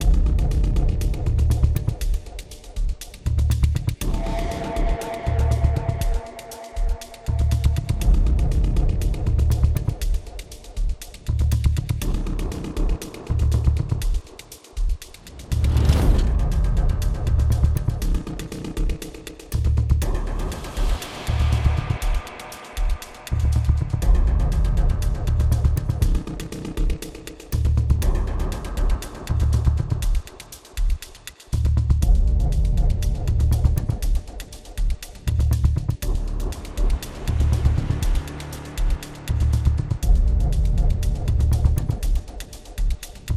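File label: Music Drive; Metroplex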